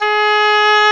OBOE; Roland - LA Composer vol. 1

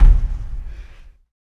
Body Perc

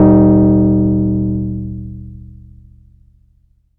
ROLAND JX3P chime Eb1; Big Fish Audio - First Call Vintage Keyboards

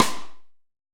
HALL RIM, HALL SNARE

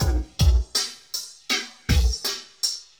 Drum Loops, Processed; Zero-G Smoke

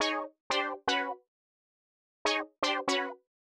120 Stabs F, Keys, Nu Jazz House; PowerFX

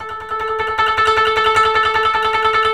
KOTO TREM

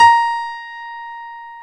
PNO, Electrics, Grands; Club-50 - Foundations

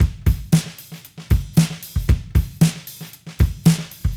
Cruisin' Disco Drums, 70s Analog Funk; Sonic Foundry (Sony Creative Software); What it Is!

